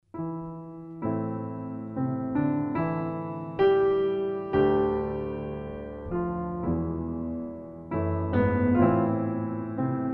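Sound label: violin sonata; sinding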